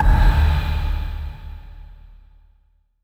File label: Impacts